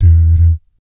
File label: "BS D D", vocal jazz fx